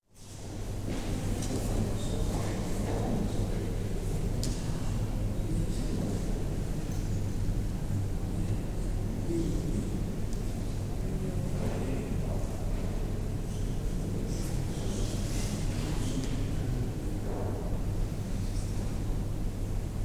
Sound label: Art Gallery atmosphere with footsteps & indistinct voices; Recorded at the National Gallery; City; BBC